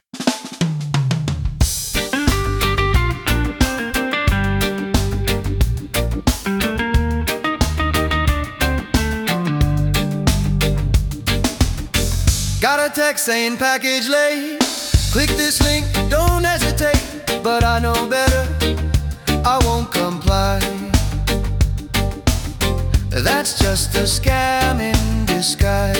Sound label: reggae; smishing